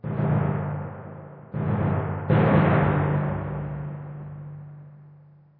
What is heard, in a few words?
Tymp